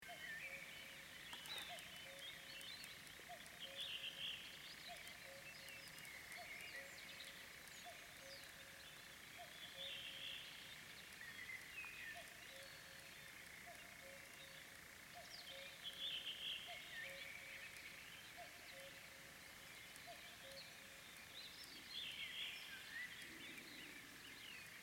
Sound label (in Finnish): yön ääniä